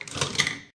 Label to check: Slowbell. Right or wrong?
Wrong